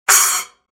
Sabian AAX 9in Max Splash Cymbal Choke

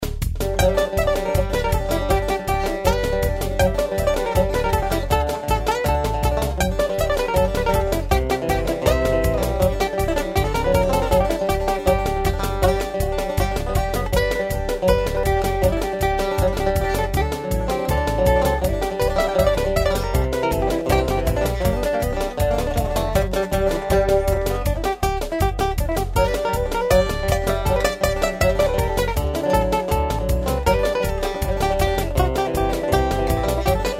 reso song

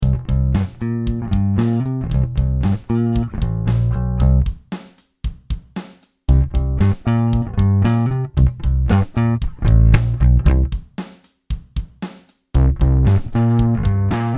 Passive P Bass Pick Tube Tone Grind Vs OD; BG500

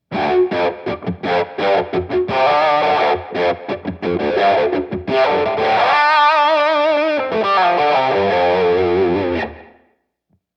Slow Burn

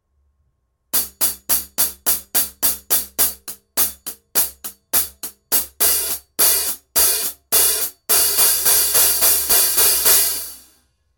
Zildjian Z3 Hi Hat 14 Closed Hits

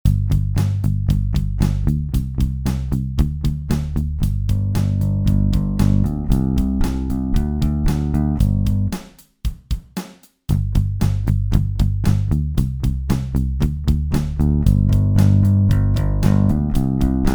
Active Stingray Pick Tube Tone Grind vs OD; BG500

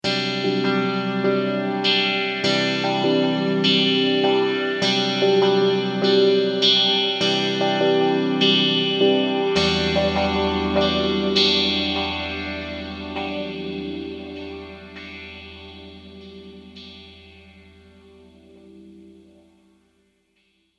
band delays

